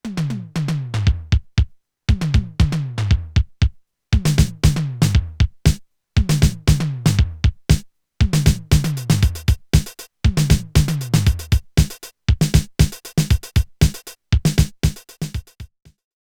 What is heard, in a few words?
S-LD Drums Toms; SoundForce